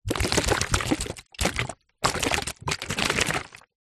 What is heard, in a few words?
food drink coffee in disposable cup shake movement; zapsplat